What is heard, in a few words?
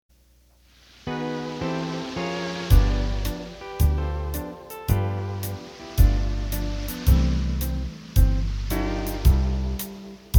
Hawaiian, accordion